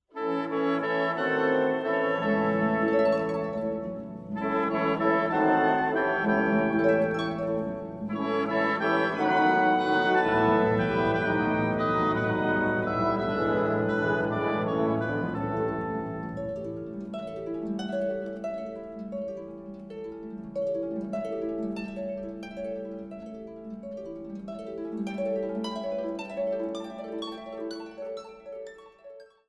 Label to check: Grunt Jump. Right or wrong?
Wrong